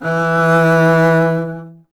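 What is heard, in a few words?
STR CBS, Solo Instruments, double bass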